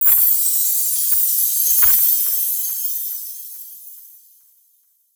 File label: Atmosphere; Zenhiser